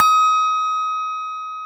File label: BELL D#, TOY HANDBELL; AKAI S-Series CD-ROM Sound Library VOL-7